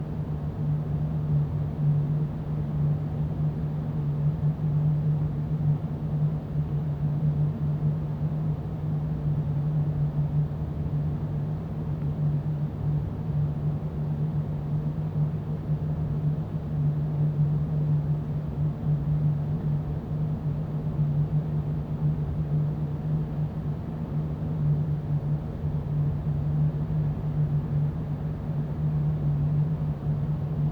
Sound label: Hvac, Ventilation, Exhaust, Industrial, Drone, Slight rumble, Loop; Hzandbits; Hvac Elements